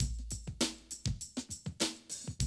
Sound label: drum loop, 100 BPM